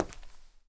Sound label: step, footsteps